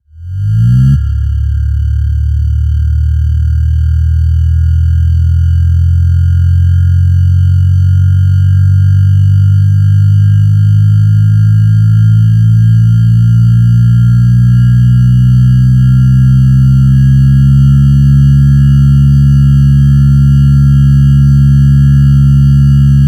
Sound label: Sfx Core